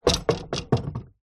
household table lamp metal antique down on table

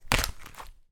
foley paper calendar hand on nail in wall

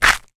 foley footstep grit gravel path

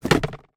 van glove box open iveco; transportation; sound effects; sound effects one

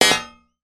impact metal bar hit place down heavy; zapsplat

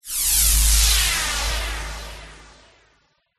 magical telekinesis blast; zapsplat

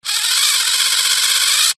science fiction robot motor server movements

